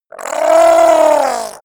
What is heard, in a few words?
goblin grunt faint pain; schreie